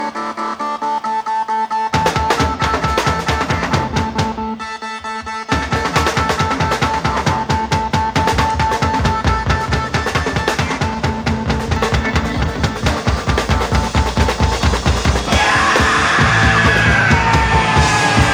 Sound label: Drum Solo; Live At The Oval 1971